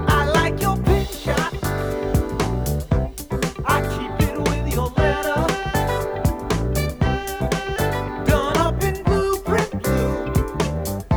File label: Audio Technica AT-LP2D-USB Turntable